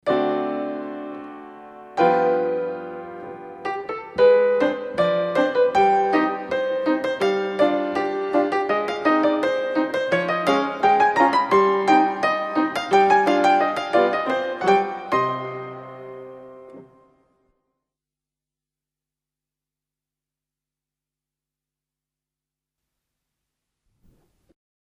Ecappe Saute, ballet; grade 1 ballet

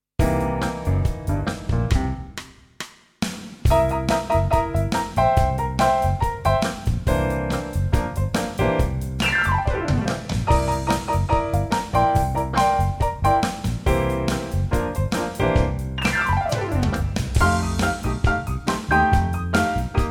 Barre; Grade 4 Tap

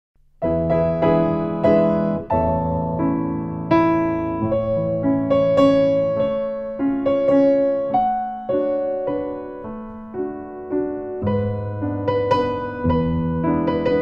Fouette of Adage, ballet; intermediate ballet

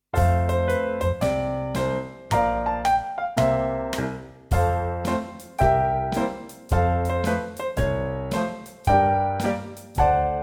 Toe tap, step, Barre, Preliminary Tap

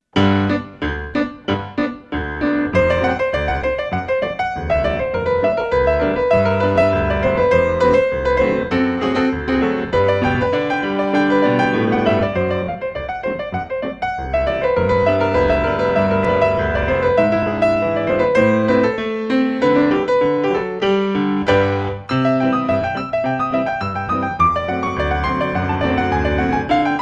Tendu & Glissé, ballet; intermediate ballet

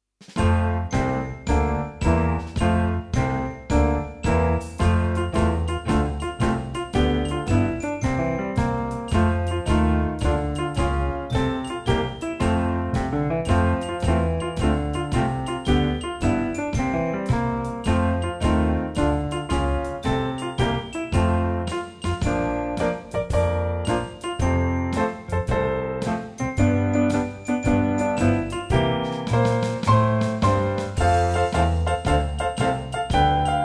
Dance

